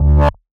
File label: Womp, techno drum fx; Single Hits